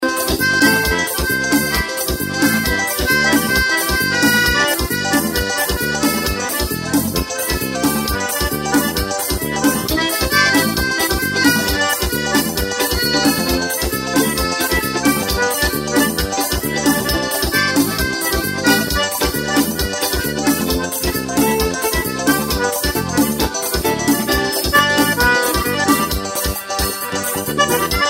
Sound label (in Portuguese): acordeon solos